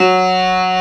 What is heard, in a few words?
STUDY PNO; East West; Global Sounds